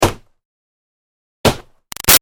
Hockey Hits Body Check Knee; Sports; Big Room Sound

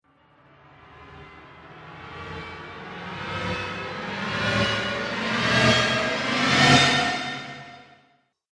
Build The Horror Transition; Transition Sound Effects

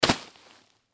Tent Folded Drop, Tent Folded Drop On Ground; Drops; Objects

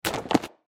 Hockey Puck Net, Hockey Puck Into Net Goal; SoundEffects-Blastwave; Sports

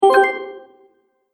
Magic Purchase Brief Ding; Cartoon Sound Design Sound Effects

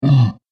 Giant Ogre Ugh, monster; Monster Sound Effects; horror sound effects

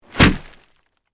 Horror, Body, Fall, On, Plastic, Tarp; Blood and Guts; BlastWave

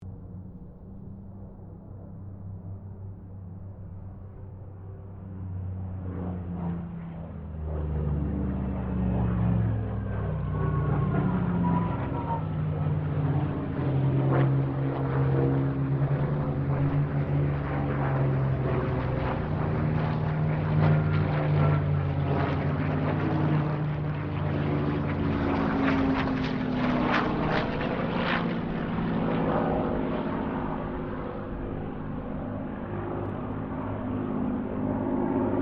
AIRCRAFT SPITFIRE MUSTANG KITTYHAWK PASS DISTANT; Mixed aircraft squadrons; Airplanes

